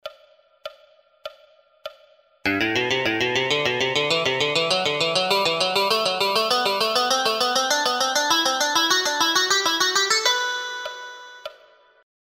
SCALES Vierergruppe

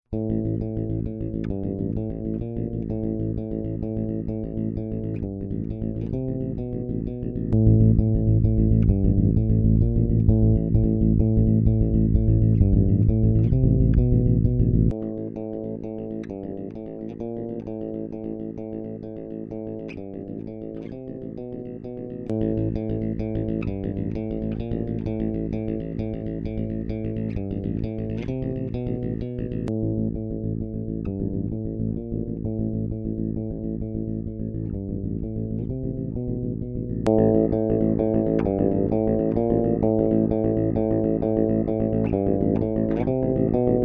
VT500 Drive Null Blend Null EQ alles Extrem

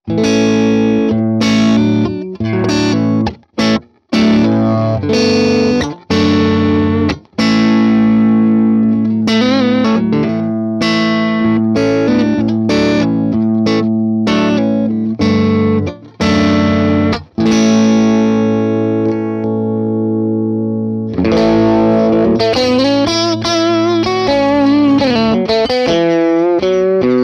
Morgan Amps AC20 Cut Vol 12 Uhr Bright Bass Cut on

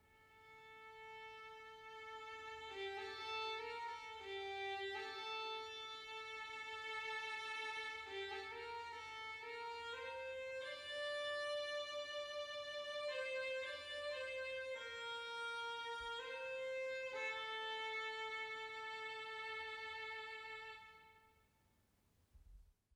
Musikaufnahme Shure MV88 Mitte Seite

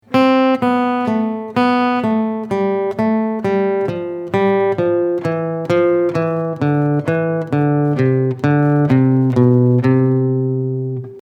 3er seq ab

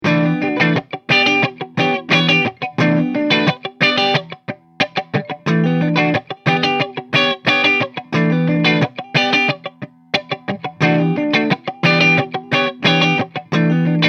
Mikrofon Clean

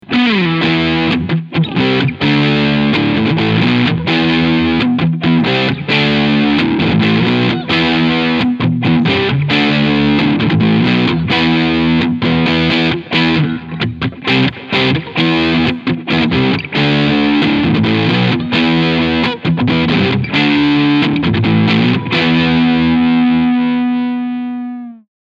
Crunch beide HB

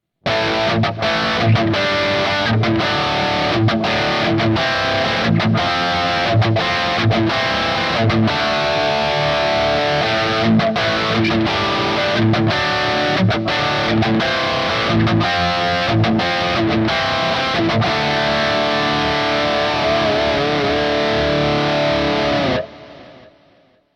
Distortion Rhythm Stegpickup

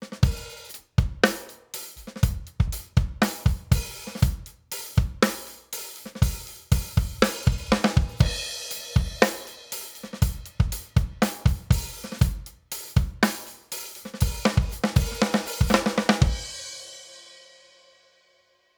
API Audio 2500 Drum Bus 50 Prozent Kompression

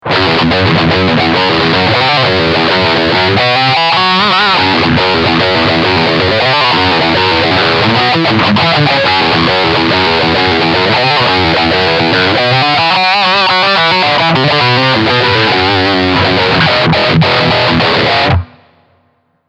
Riff Steg Humbucker

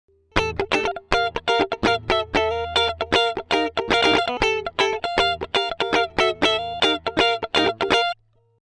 e git fast odd; Dave Hill Designs Europa 1

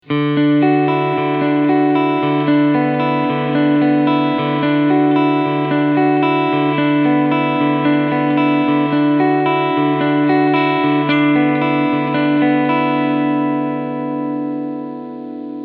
Pickupfahrt Clean

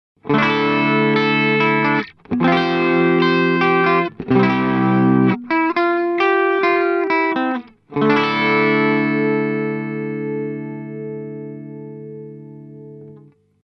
Korg NuTekt TR-S Brighter Setting wenig SAG P-90

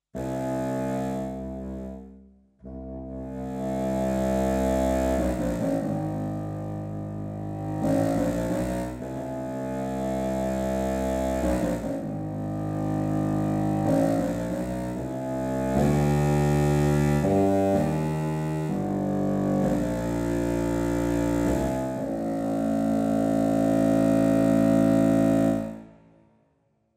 woodwind kontrafagott solo

